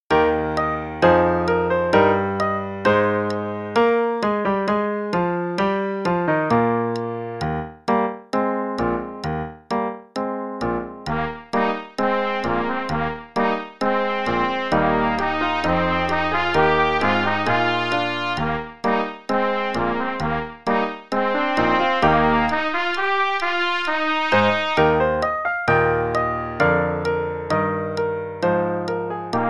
Play-a-long March Majestic with trumpet in Eb, qn=132